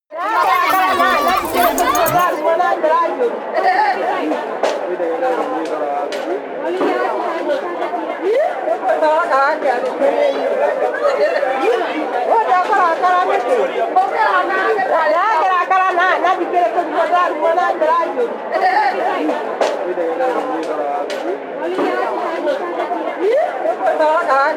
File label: african market